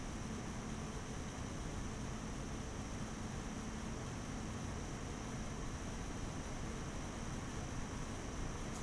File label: Audio Torture Noise